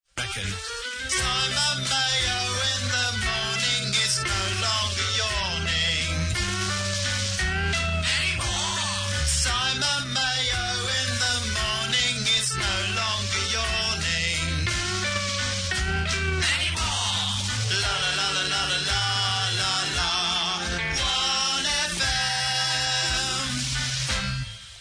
yawning song; simon mayo

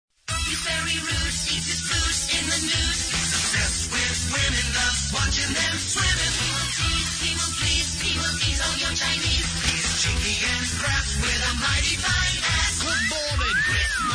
early jingle songs; chris moyles

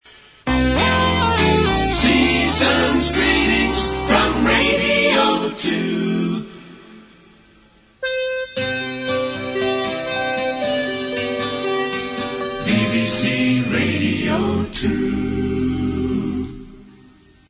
jingle

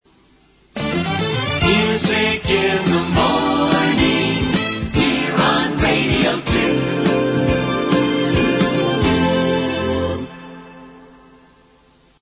jingle

